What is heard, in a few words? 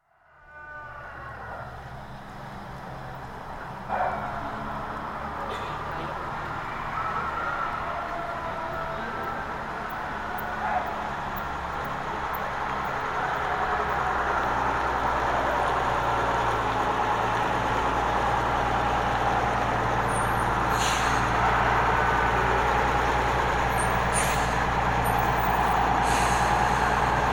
Village, Bonito, (Mato Grosso do Sul, central Brazil) dawn, with cockerels, wind in trees, footsteps, some distinct speech and traffic; including 2 buses arriving and collecting people; Outdoor; BBC